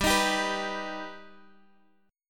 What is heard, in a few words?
Eb Augmented G